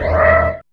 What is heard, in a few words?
SCREECH L